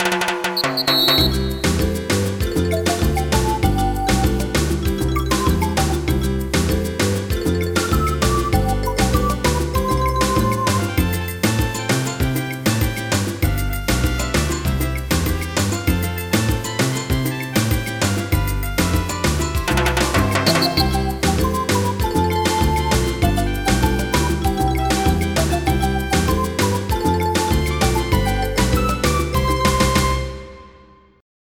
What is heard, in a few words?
island, Easy Listening